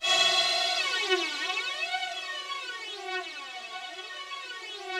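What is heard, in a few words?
Rough Strings F#3, Yamaha VP-1; 90 s Sample CDs; Music Store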